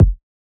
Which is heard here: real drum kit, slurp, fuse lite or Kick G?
Kick G